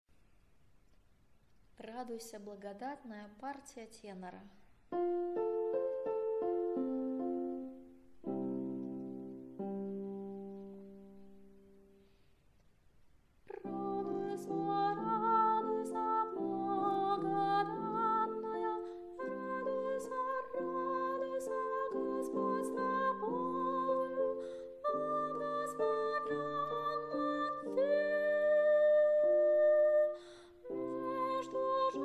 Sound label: tenor; Radujsja Blagodatnaja